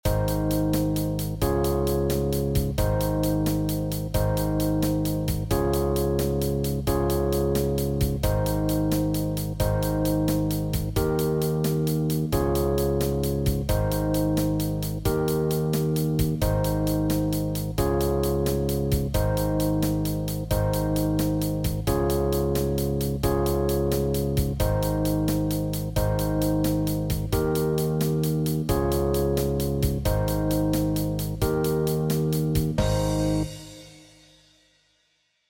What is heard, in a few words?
6/8 Blues No Guitar; Tryout track; 2027 MMEA conference All-State Collective Ensemble audition